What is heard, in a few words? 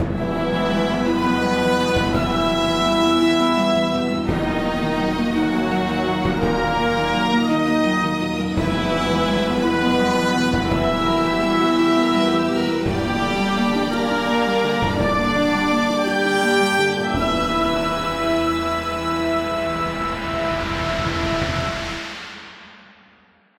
Puzzle Success; Mirror Room Second